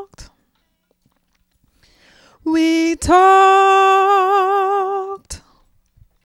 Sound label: diva